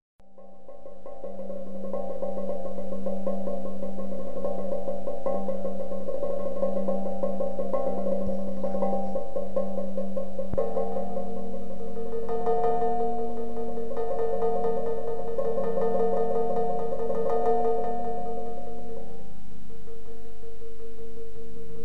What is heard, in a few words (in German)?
singende Steine, Klangstelen